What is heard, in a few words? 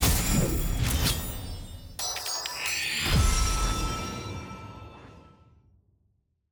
victory point reward transition; clash